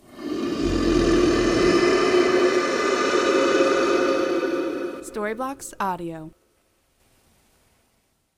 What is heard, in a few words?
dragons long breath